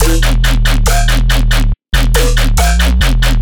bass loop 140 D#; Virtual Riot

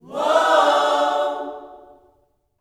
WHOA-OHS, Vocal Gospel

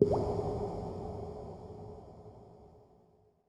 SPACE FX

